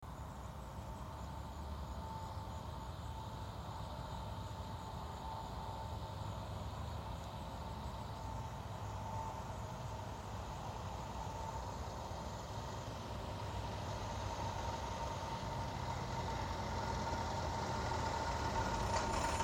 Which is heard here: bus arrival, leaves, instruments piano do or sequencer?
bus arrival